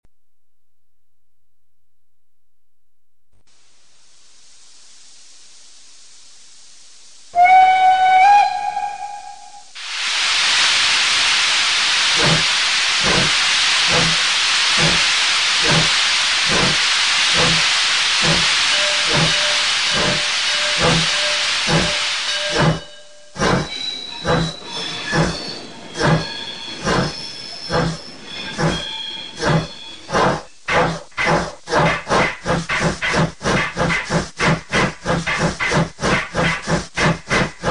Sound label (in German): Soundbeispiel Dampflok BR399; Roco; Made by Razis